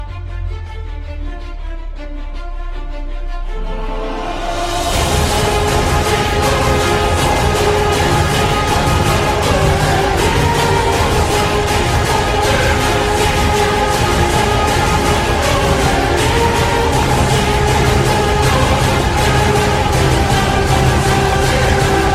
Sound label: Epic Soundtrack